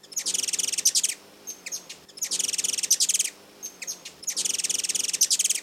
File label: ruby throat humm